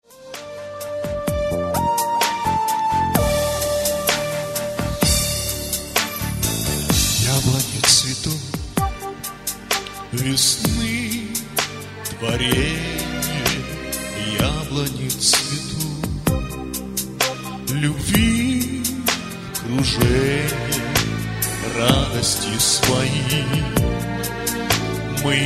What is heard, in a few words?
Vocal